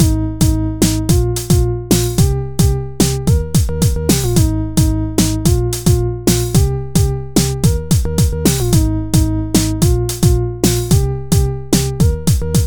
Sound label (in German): Oszillator Dancehall